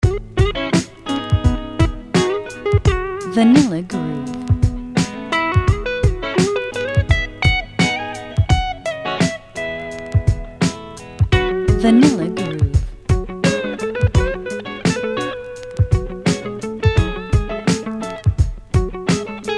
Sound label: Guitar Bits n Bobs